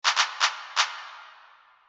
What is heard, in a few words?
clap fill